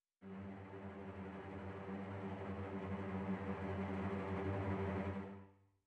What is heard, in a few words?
Cop Arrival Elevator